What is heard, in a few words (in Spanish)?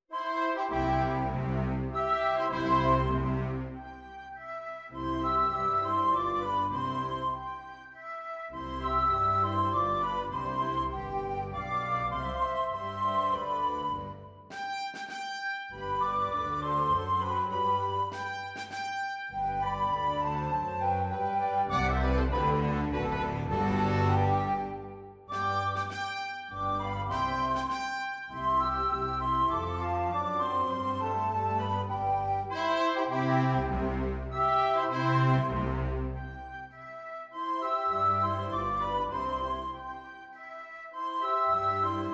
para flauta dulce y banda; flauta pico y banda; SINFONÍA DE LOS JUGUETES (Kindersinfonie) (Toy Symphony), 2.º movimiento; Fernando Bonete Piqueras